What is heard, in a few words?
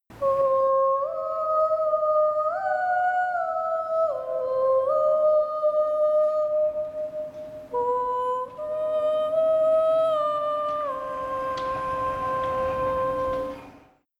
Tunnel Vox (Original)